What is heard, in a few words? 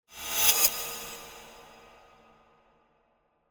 Whoosh Metallic Thin Attention Grabber - Fast Double; Hollywood Edge; sound designer's toolkit v2